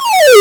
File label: blip, 8bit